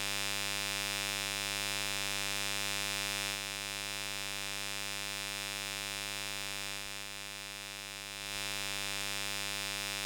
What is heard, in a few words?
Electromagnetic Fields